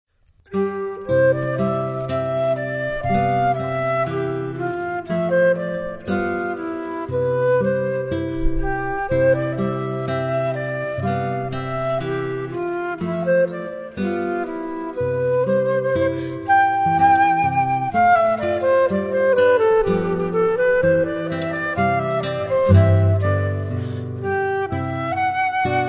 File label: Flute Guitar